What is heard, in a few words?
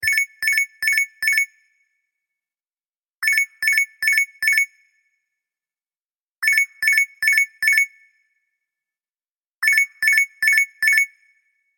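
standard signals lg simple ringtone; lg prostij dzvinok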